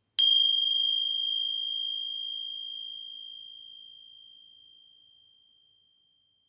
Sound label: Tibetan Bell, Single, lingering ring; Can also be a hotel reception bell; Bell Sound Effects; Musical; Lynne Music